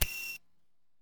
ui click; orbit

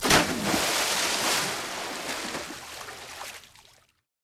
battleship miss

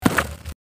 Enemy Chase Death; overrun

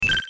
pellet

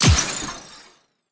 battleship launch

